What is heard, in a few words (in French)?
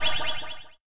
Balle Ghost